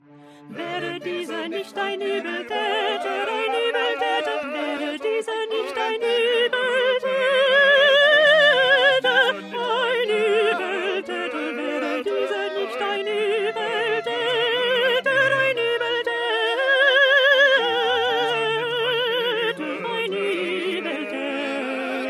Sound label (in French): petit choeur alti